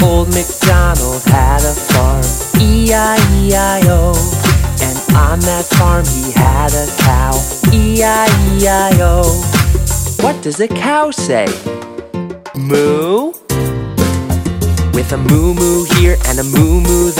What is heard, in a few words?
Old McD Had a Farm